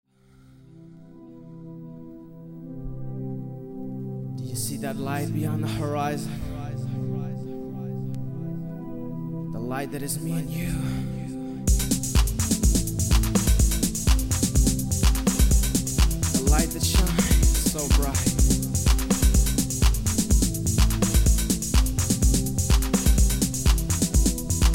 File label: distant drums